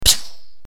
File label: Shot; Assemblee SFX